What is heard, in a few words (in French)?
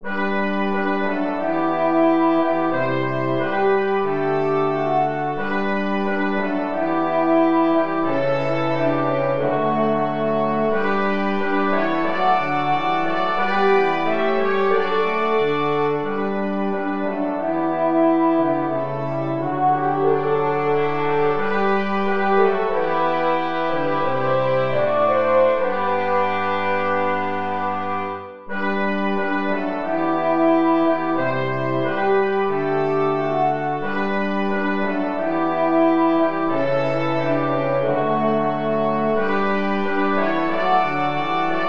Quintette cuivre